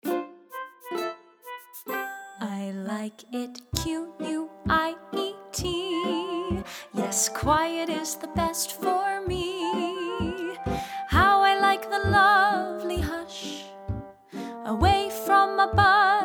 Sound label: vocal